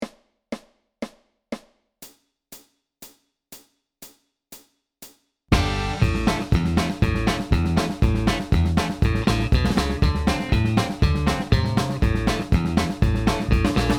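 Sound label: rhythm only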